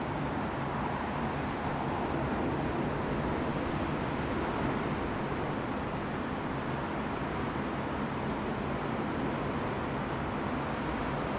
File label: gale